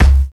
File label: 2000s rich mid-range hip-hop kick single hit c sharp key